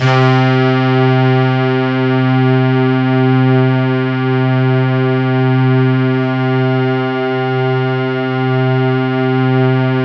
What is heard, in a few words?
C3, Synth Line; 90 s Sample CDs; NiceBeats; SynthLine VOL-27